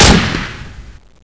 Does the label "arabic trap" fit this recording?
No